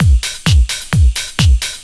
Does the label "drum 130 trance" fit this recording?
Yes